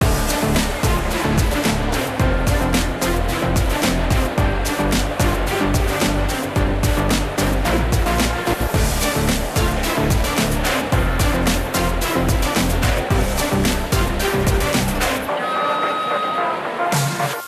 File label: theme arena